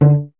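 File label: strings violoncello D; Miroslav Vitous; Solo Instruments VOL-1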